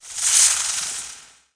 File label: sfx Invisibility; lego ninjago rise of the snakes